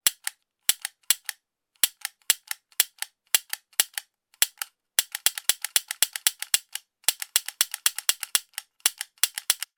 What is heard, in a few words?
toy gun fire multiple varying speeds